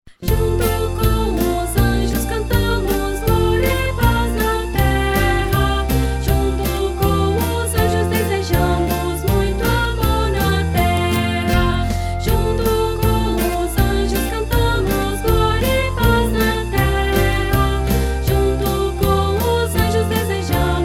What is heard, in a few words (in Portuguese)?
Gloria crianças